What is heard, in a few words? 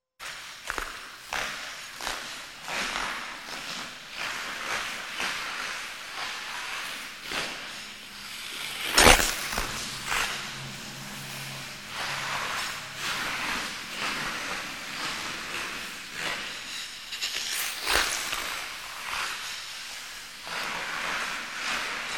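several skaters pass in front of us; Звуки катания на коньках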